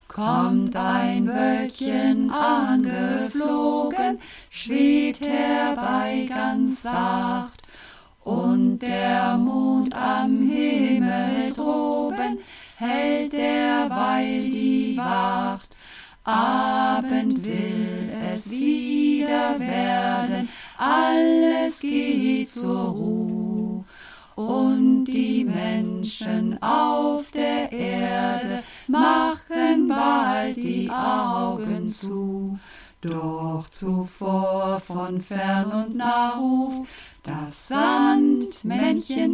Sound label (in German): Kommt ein Woelkchen angeflogen Mehrstimmig